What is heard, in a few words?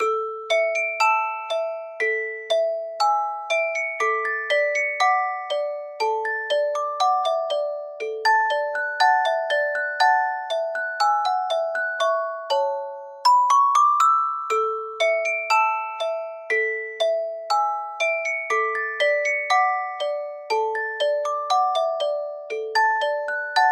Musical Box; Phantoms